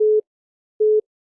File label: call waiting